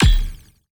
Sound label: cleaner bounce